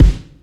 warm boom bap hip hop bass drum g key, Royality free bass drum tuned to the G note.